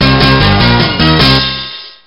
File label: Level Complete; Die Wilden Fussball Kerle Gefahr im Wilde Kerle Land; GBA